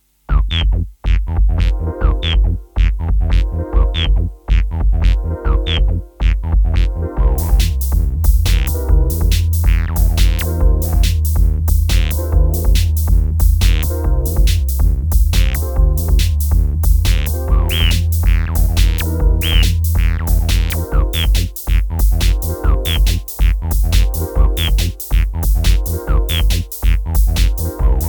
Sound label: Tascam 688 MIDI Studio